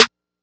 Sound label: SN, Snares